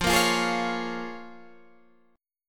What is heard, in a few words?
A sharp Minor 7th